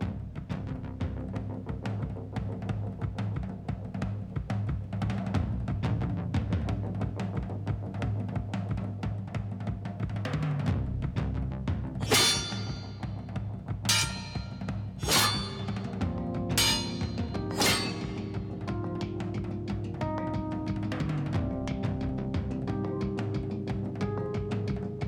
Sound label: fade to drums and handpan; IvorFight A (into) ~ B; mcsm music; Netflix